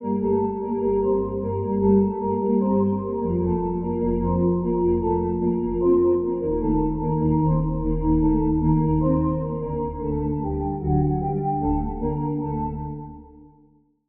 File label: Woodwind; River Soul; Routed Sound; Vital Expansion - Story Walker Vol.1